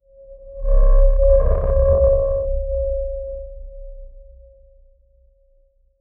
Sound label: a deep resonating sound of a magical forcefield